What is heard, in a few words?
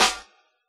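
snare drum Jungle, Yamaha DJX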